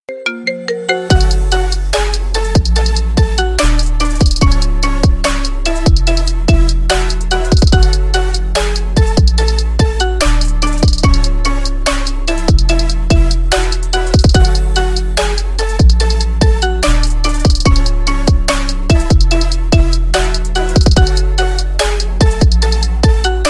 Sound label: marimba remix ringtone